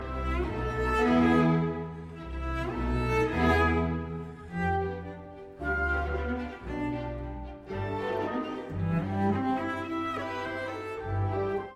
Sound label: CELLO